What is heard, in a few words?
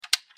wheel hover